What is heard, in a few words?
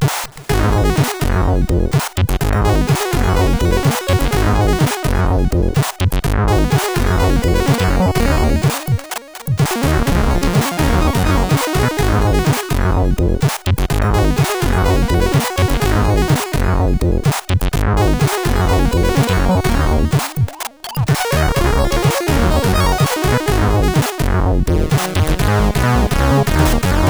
sid CSG8580R5; Owen Crowley; Dump Draxco 1x speed version